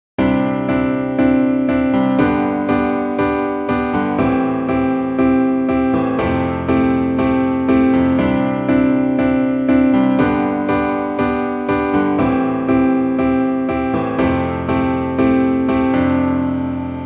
piano

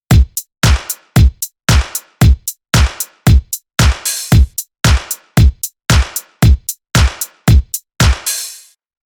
Drum

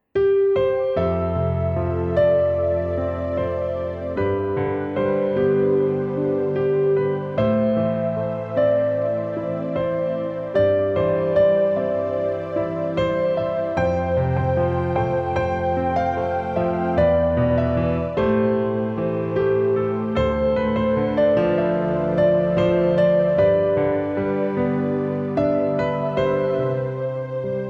stein synth

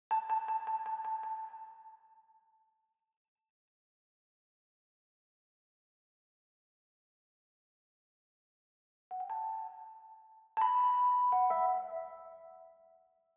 E. Piano

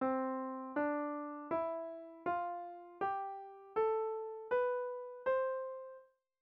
c-major, piano

